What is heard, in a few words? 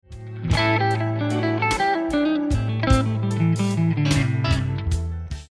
Lick, guitar; Beginner/Intermediate licks; Blues Guitar Method 1